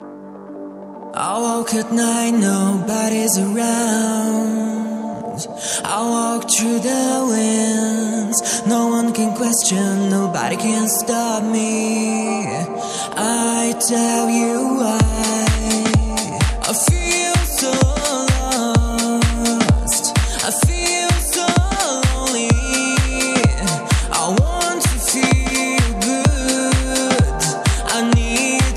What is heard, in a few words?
Dancefloor Groove